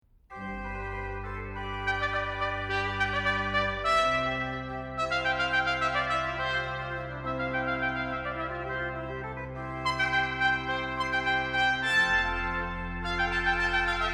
Sonata Prima per tromba sola - Allegro, Trumpet And Organ; Op4; GB Viviani